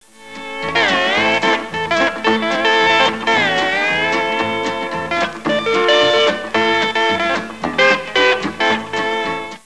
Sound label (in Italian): musica strumentale